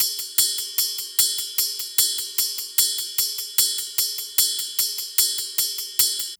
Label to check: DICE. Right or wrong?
Wrong